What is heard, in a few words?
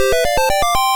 Achievement Unlocked; danielTheBard